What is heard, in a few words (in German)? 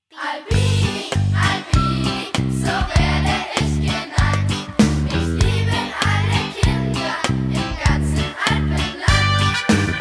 alpini song klingelton mit sanger